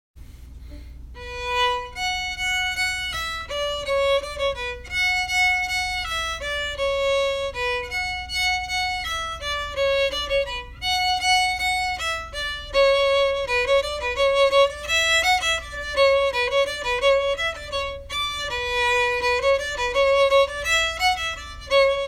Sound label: An Dro in B minor